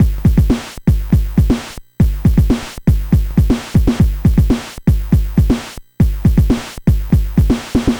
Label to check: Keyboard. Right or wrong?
Wrong